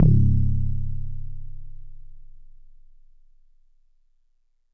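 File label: Metal Tube DX100 C#1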